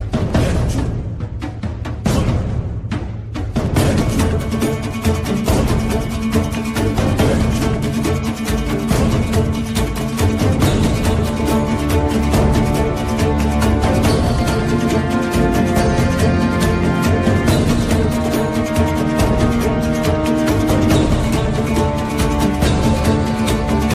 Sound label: music arabic ringtone